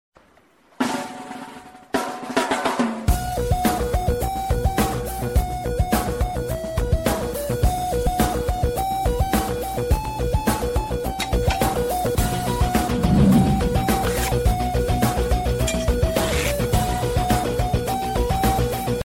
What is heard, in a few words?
huawei flute music ringtone